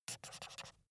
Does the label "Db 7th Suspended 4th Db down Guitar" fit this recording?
No